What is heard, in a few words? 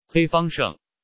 Win